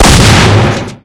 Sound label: shotgun single; hl2